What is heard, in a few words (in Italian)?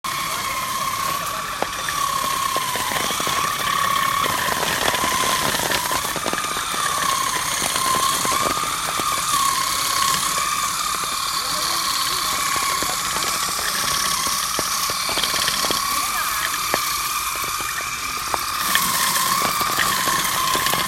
Sbattitore di olive vignale La Spezia